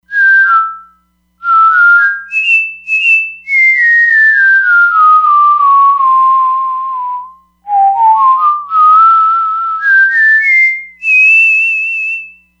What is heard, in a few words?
Whistling, E-mu Proteus-3; Factory patches